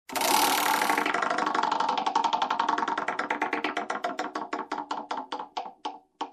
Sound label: wheel music